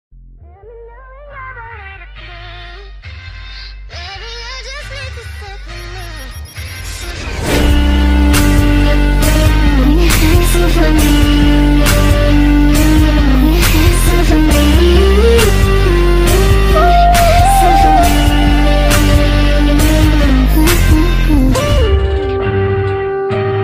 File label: speed up english ringtone